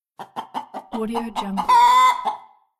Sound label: hen